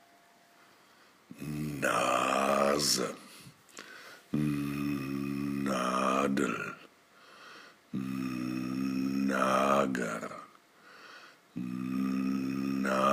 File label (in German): Stimmübungen Nasallänge